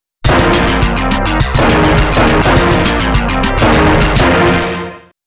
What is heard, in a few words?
STAGE CLEAR; snk arcade sound digital collection vol. 01